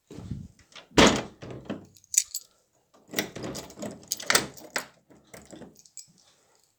clé serrure; Bruitages; Metal

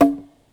Gourdin Buche Tom median; Percu Bois

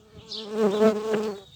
Abeille bon equilibre vol; Vols Bourdn abeilles

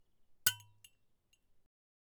Cloche aigue bouteille

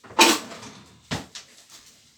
Metal quincaillerie claquement

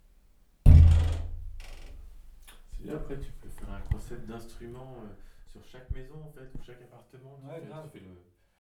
Kick qui grince; Kicks; chez noé

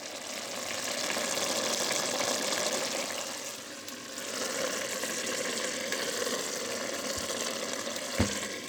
Poele ca frit; Bruitages

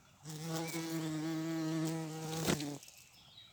Bourdons atterri; Vols Bourdn abeilles

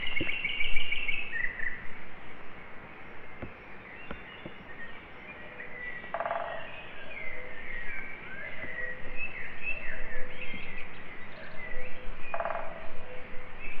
Foret pic vert coucou tourterelle fort, Oiseaux

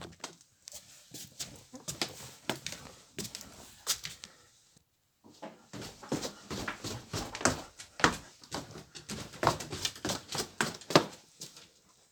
Bruitages bois escaliers; Percu Bois

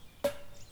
4x Baton Sec résonne un peu, Percu Bois

